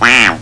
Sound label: QUACK; animais